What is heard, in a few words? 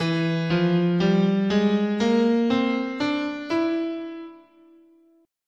E Altered, guitar, scale